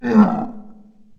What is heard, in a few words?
devil groaning; monster